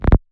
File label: Misc Bass F#